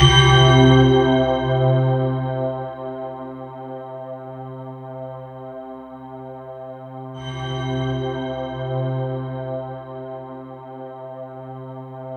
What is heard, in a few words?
C3, Synth Line; 90 s Sample CDs; NiceBeats; SynthLine VOL-26